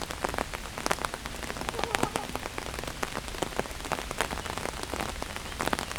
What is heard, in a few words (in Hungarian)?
esernyő alól, tűzróka; pecsi zoo 2017